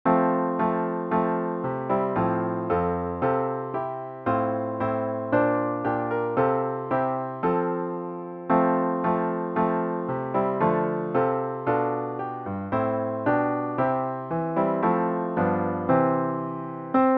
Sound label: piano only; Hark The Hearld Angels Sing